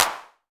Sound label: Clap TR-909 Unprocessed; PUNK VS WOLF